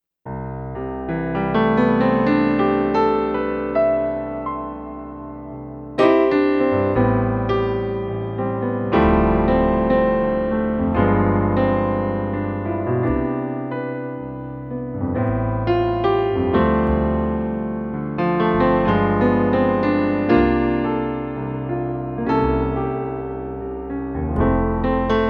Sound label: Piano